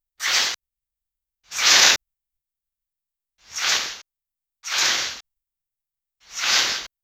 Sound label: swosh sound; physical